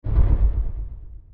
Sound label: echo, footsteps; elder titan